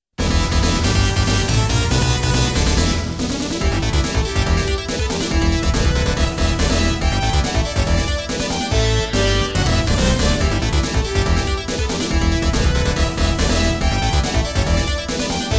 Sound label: Fight; Konami Music Masterpiece Collection